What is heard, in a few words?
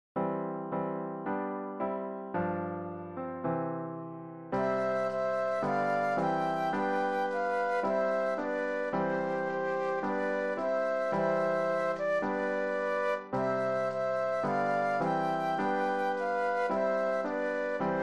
Flute Duet and Piano